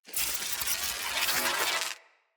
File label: Shock Mote Strike Charge Up